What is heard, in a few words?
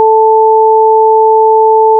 440 left 880 right